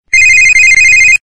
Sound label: Ring; Seeley Booth